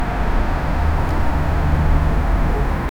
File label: ambient